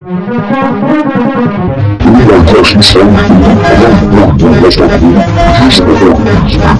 romanian disco

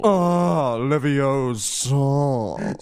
ahh

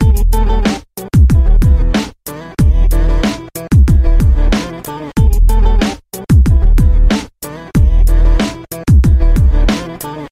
saz trap

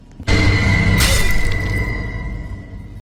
jumpscare; sentinel

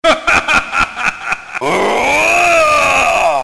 laugh and scream; omega rugal

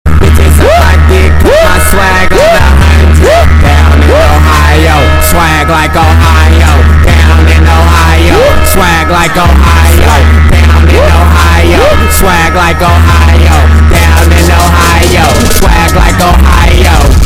bass boosted

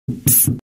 screech psst; doors

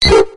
pidgey

